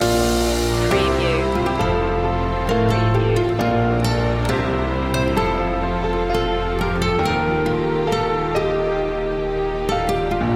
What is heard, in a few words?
sincerity main theme intense